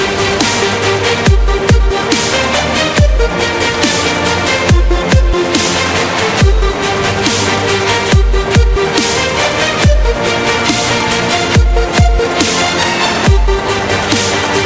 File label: Dubstep Remix